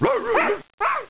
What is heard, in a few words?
fetch bark, dogs; Yahoo! Messenger; purinadogs